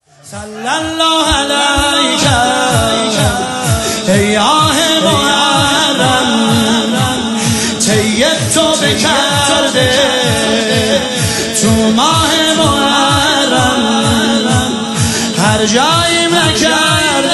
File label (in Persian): مداحی